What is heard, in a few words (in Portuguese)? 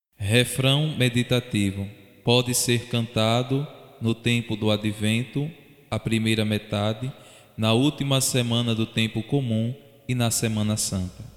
refrão meditativo advento tempo comum semana santa